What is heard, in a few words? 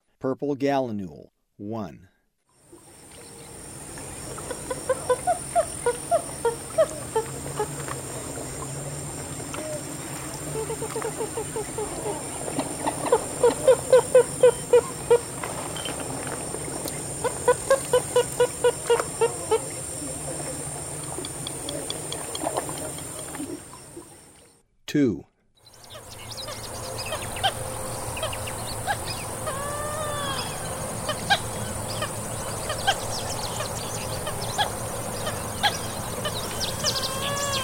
Purple Gallinule; S. America; Eastern Lowlands